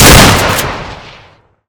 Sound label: m3 shoot pump, weapons; garrysmod; kimm3